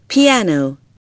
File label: PIANO